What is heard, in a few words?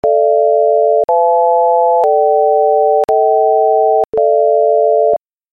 4 chords progression; the sounds of hydrogen